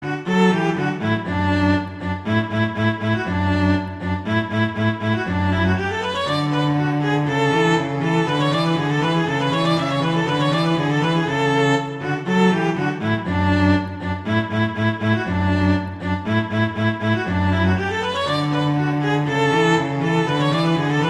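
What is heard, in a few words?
Duet, horn; Mozart; Duets; Vla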